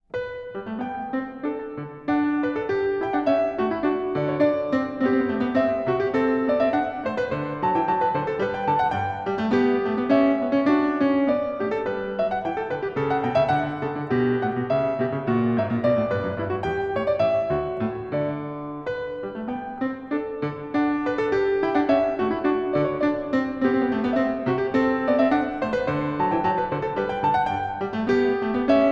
Canone alla Sesta a 1 Clav; Bach Goldberg Variations BWV 988 (Live)

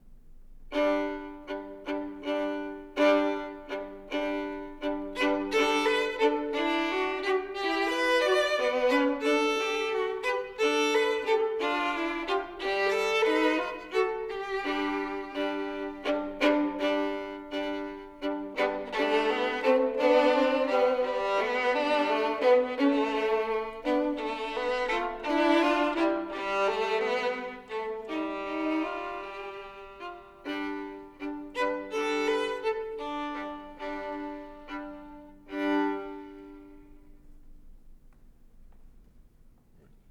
Duos for Two Violins, Menuetto

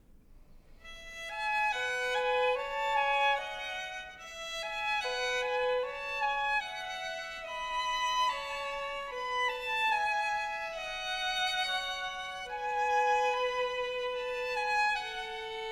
Duos for Two Violins, Slovakian Song

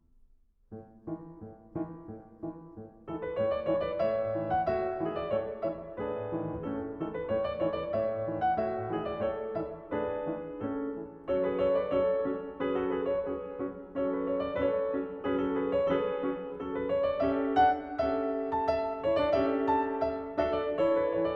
Wedding Tune, Piano; 25 Norwegian Folk Songs and Dances, Op. 17; Grieg

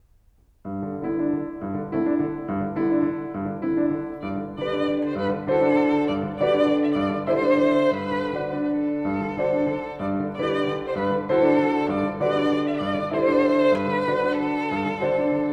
Canción for Violin and Piano; Popular Spanish Suite III.; Arr. Kochanski for Violin and Piano After Falla's Canciones

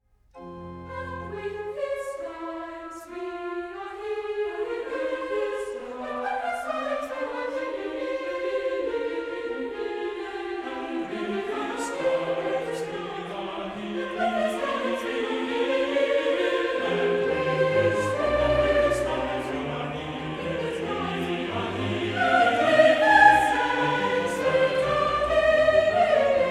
Chorus; And with His Stripes We Are Healed; Sir Neville Marriner; Handel Messiah

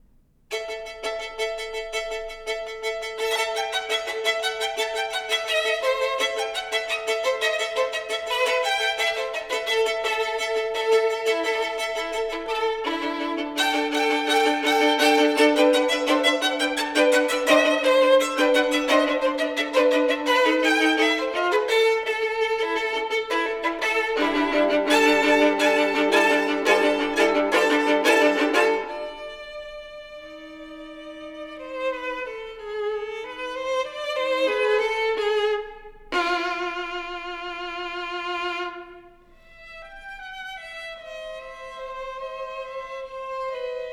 Duos for Two Violins, Ruthenian Kolomejka

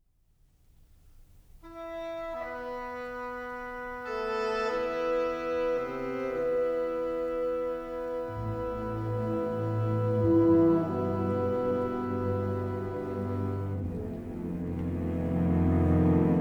Cello Concerto I. Lento

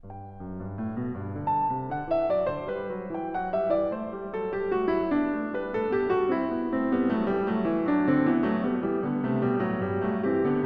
Canone alla Seconda a 1 Clav; Bach Goldberg Variations BWV 988 (Live)

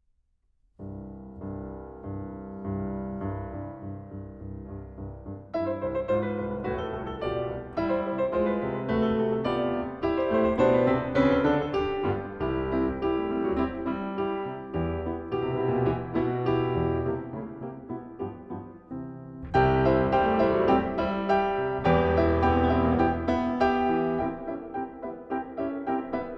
Stumping Dance, Piano; 25 Norwegian Folk Songs and Dances, Op. 17; Grieg

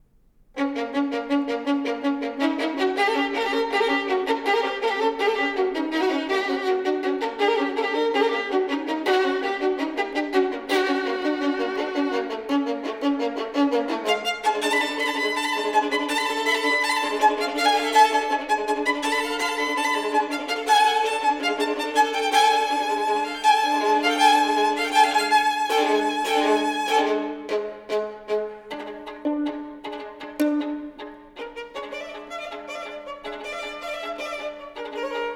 Duos for Two Violins, Arabian Song

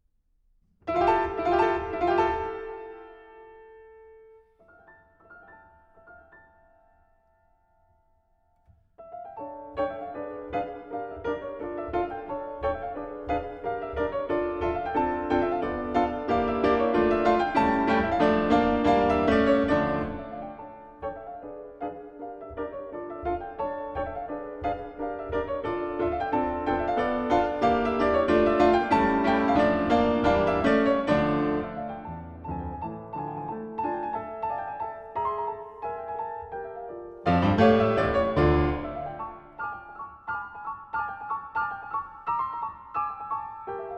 Dance, Piano; 25 Norwegian Folk Songs and Dances, Op. 17; Dance from Jølster; Grieg

